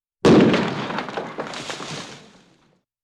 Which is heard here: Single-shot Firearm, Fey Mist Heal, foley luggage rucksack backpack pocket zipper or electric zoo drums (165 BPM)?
Single-shot Firearm